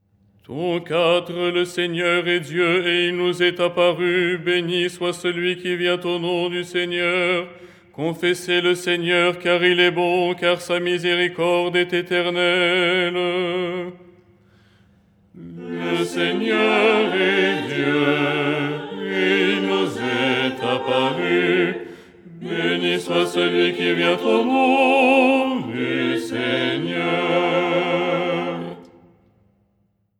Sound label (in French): basse; " Le Seigneur est Dieu… "; Les 8 tons en français; ton 4